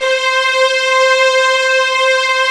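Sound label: strings, Brite; String Master Series; Vlns 2-5; Vls3 BriteNS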